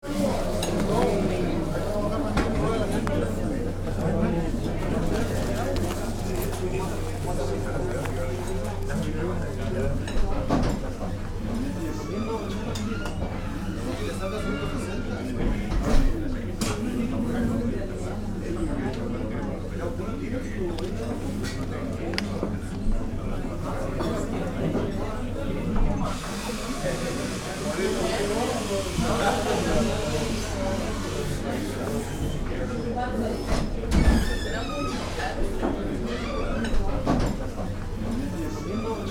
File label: Cafe in Montreal neighborhood busy walla in English